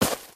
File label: gravel, human step